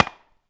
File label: Hit Spike; Civ4 warlords